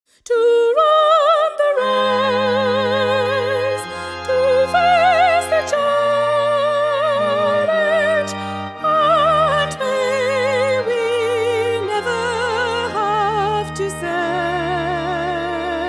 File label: Soprano